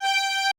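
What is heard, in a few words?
violin quartet F G4; AKAI Advance Orchestra Upgrade 97 Vol.1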